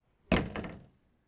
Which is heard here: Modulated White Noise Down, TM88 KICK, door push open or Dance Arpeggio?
door push open